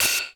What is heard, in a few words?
BLASTY HAT, FX PERCS; Crypton - DrumBase5000